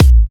Electro Kicks

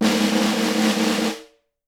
SM SN, Percussion; Miroslav Vitous - Percussion & Harp

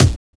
kick, Drum and Bass; EZ Rollers; Kick Set 3; Instrument Samples

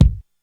Kick, Korg DSS-1 Drums

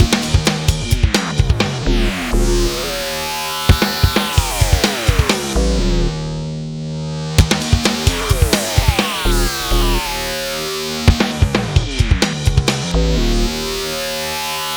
Hardcore Breakbeat, 130 Dbmin; Big Fish Audio; Hardcore Breakbeat Action; Arcadia

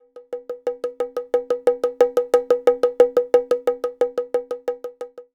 Doppler Bongo, processed; Sonic Foundry (Sony Creative Software)

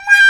Trpts

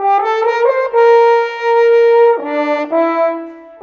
Horns, Orchestral, Rock Pop; Sonic Foundry (Sony Creative Software); Orchestral Series VOL-4 - Rock and Pop

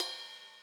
ride, Millenium MPS-400

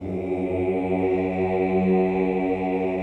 CHO F#1, Ooh; Ooh + Ooh 2

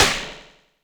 ANALOG KITS; 90 sSampleCDs; Crypton - DrumBase5000; ANALOG KITS2

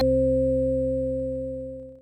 synth, amiga med

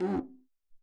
Guica Up, Alesis SR-18

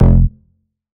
Bass F, Bass Synth

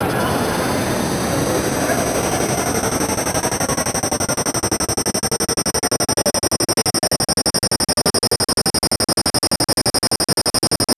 Tension Builder 75 BPM, fx

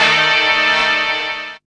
DR. LOOP; X-Static Goldmine 3

